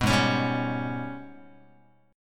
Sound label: Ab Minor Major 11th, Guitar; strummed; 4A,4E,5A,5D,5G#,6C#; 2,4,4,5,5,x; JGuitar